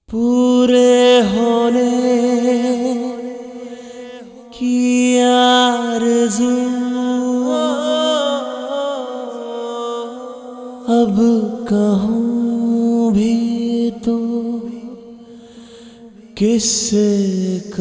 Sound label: Sad Version